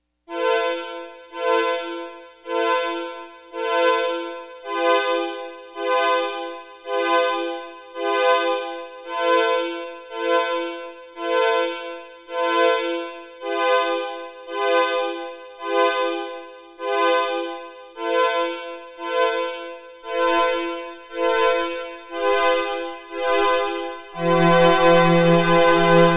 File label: Analog Strings; Sequential Circuits Prophet-5 (Ver.3)